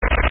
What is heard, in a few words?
Door Hit